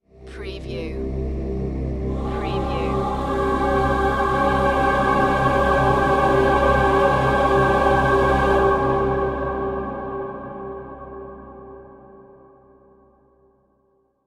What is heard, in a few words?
Swell Choir